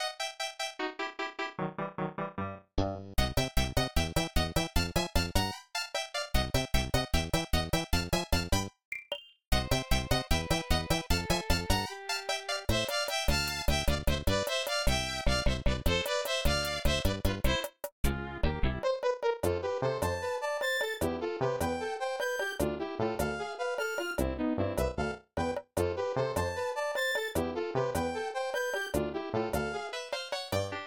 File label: Athletic; SMAS; SMB3